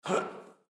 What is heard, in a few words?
Jump; GGJ2022